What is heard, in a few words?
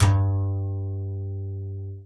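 acoustic bass slap G2, right; Masterbits CLIMAX 7 - Bass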